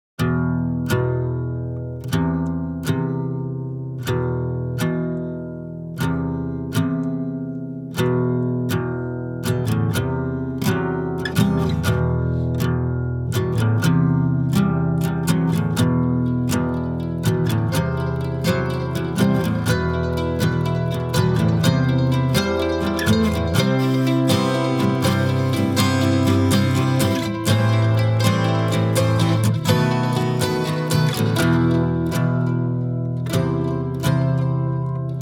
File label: Acoustic; Certain Plan